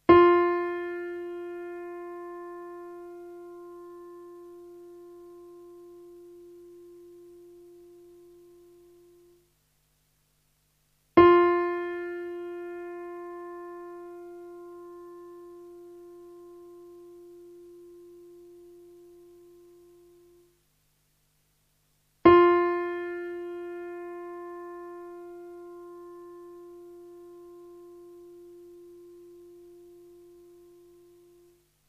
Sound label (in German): Stimmton Trompete g' (f')